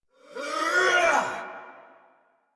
Attack Effort; Warframe; Dax Ashigaru; en

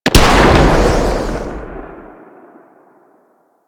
Torpedo Explosion Underwater, weapons; Grn Torpedo Pistol

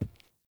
Grunt Boot Walk Wood; Characters; Enemy; Melee Grunt; Footsteps; Boots; Sinecure

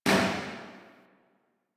Tail Generic Interior Medium, Weapons

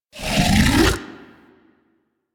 Monster Spit Inhale; Warframe; Infested

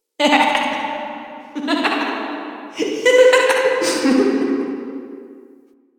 Dungeon Island Recorded Children Misc Ambience; Warframe; Duviri

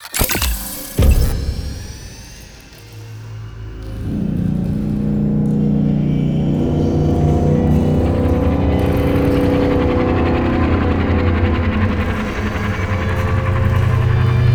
Device Insert Buildup; Vitruvian; Warframe; Sacrifice; Liset

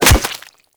Melee Staff Impact Flesh

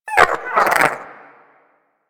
Wyrm Idle, Vocals; Warframe; Pets

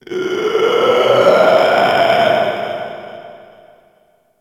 Infested Runner Dist Vocal; Warframe; Runners

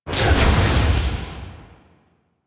Sword Heavy Attack Burst; Enemies; Weapons; DE; Warframe; Teshin

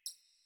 Computer Room Beep Misc

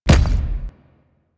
Obelisk Stone Connect; Warframe; Infested Eris

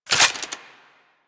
Laser Aim Rifle Reload, Weapons; Grineer

